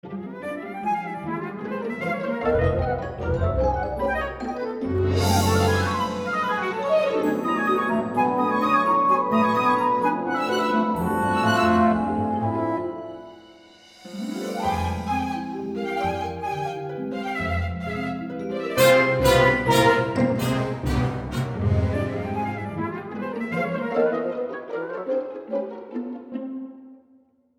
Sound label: Orchestra; orchestral reverb